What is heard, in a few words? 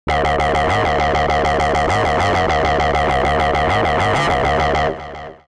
Synth Mono; TSMtoolbox